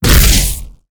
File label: Gun Skin